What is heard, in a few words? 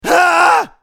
usec fall; eft; scales